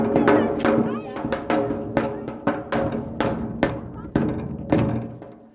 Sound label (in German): klopfen auf rutsche